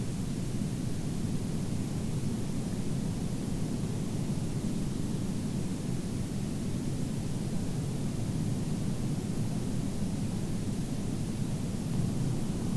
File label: WFR audio emfisis